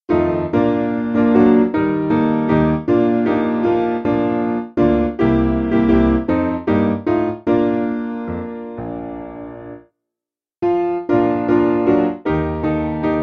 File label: Piano